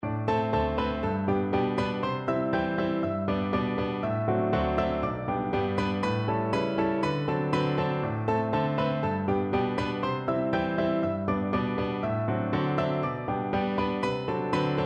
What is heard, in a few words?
offenbach can can, piano duet